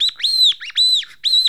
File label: BIRD, bird call; Greytsounds VOL-1; BIRD CALL FX